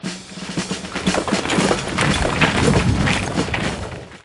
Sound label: pick, projection; ui; tinker; dota